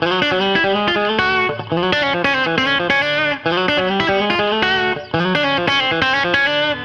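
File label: Country Guitar (Chorus Sec 1); Digidesign; Pro Tools Essential Loops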